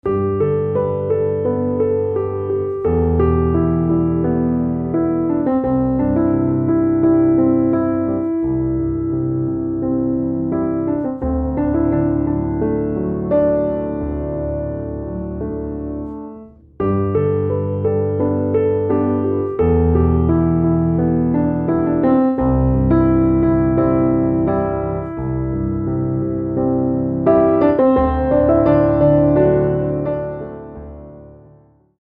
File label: Piano